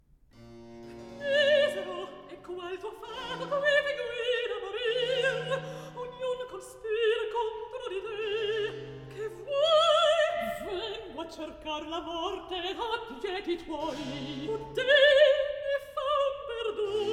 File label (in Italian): Recitativo; Misero; Handel Masterworks; Klassika